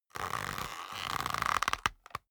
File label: leather creak soft